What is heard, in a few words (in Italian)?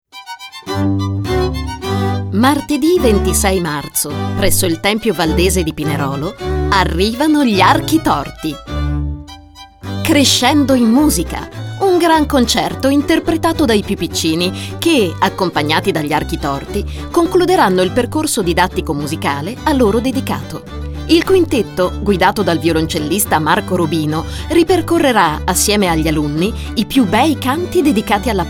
spot crescendo in musica